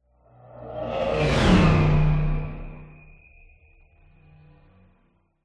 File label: science fiction craft pass by robotic mechanical futuristic